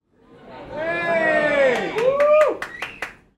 human audience comedy club person cheer clap audience tone background; komedia; comic boom